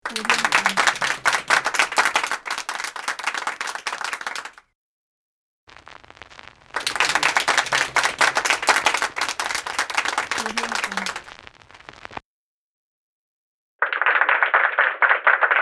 small room applause

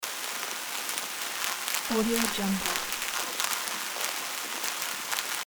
insects loop

